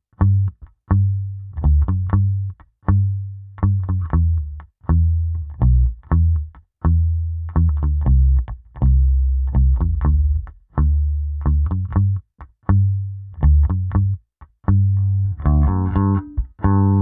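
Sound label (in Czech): Oba snímače s plně otevřenou tónovkou, Jazz Bass; Sadowsky NYC Ultra Vintage J Bass Guitar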